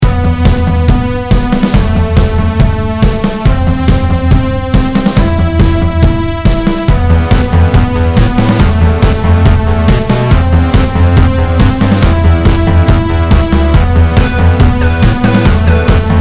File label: Factory; Kraino Origins; NMC Factory